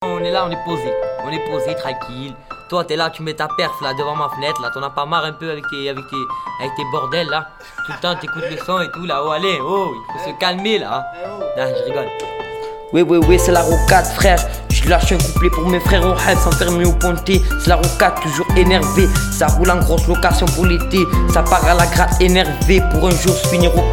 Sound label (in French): Instru; cef 2013